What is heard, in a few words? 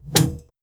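single footstep robot metal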